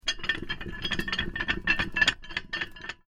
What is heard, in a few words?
Heavy Chain Rattling On Winch Short; Chain sounds; Metal Sound Effects; Lynne Music